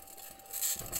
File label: mic, mars2020 edlcam